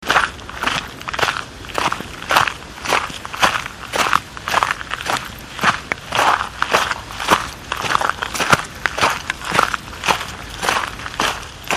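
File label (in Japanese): jari, gravel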